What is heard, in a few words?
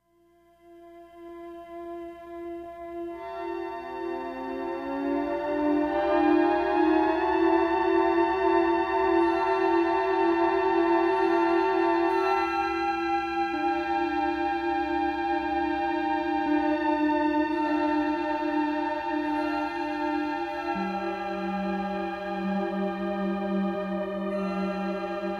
harmony